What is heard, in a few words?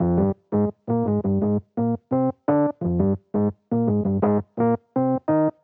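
Jazz Keys Electric Piano 85 bpm Riff F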